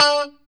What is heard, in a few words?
POP D 4, STRAT guitar; Spectrasonics; Hans Zimmer Guitars VOL-1; JC-STRAT POP